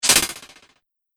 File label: Swish Sharp Rattle, whoosh; Whoosh Sound Effects; Andrew Fleming